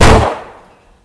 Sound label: revolver